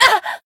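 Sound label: Voice damaged, Japanese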